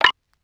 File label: snare; dough-samples; eddyflux